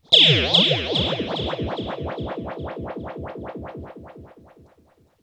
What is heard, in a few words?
RIPPLES R, Funk Guitar; 90 s Sample CDs; Zero G